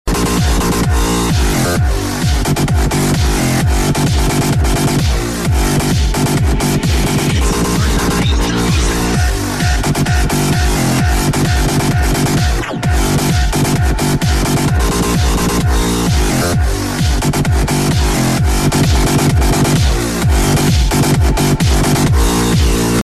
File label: hard pop remix